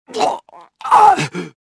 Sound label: gurp; kyle